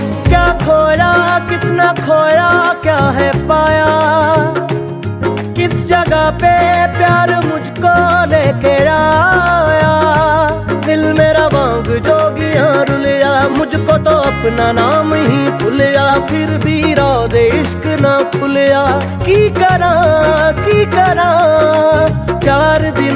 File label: New Punjabi Song Ringtone